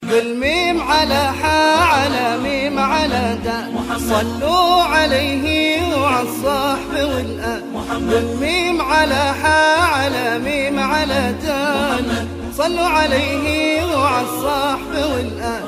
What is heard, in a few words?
islamic tone